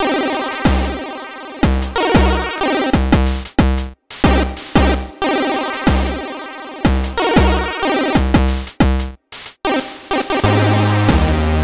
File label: boss level; steamcommunity; towerattack; SteamMonsterGame